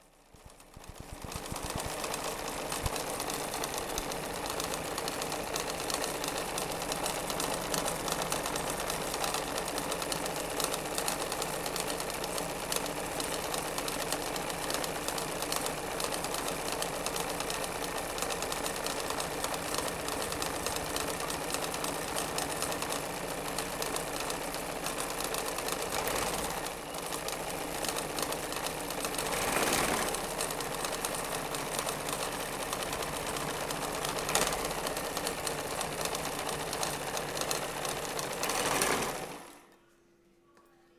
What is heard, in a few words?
Sewing Machine